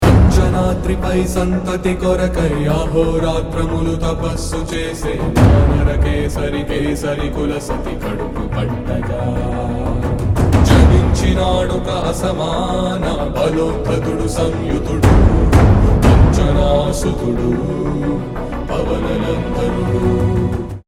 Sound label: Telugu Song; hanuman; prasanthvarma